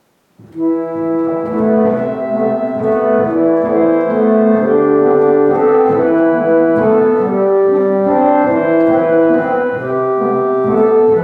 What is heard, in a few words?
harmonium